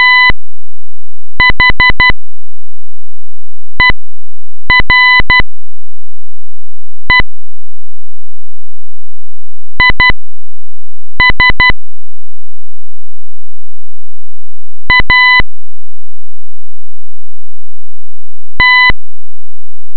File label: tornado